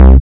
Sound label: kick hard beater